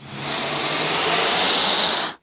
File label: Uplifter